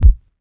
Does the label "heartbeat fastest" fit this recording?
Yes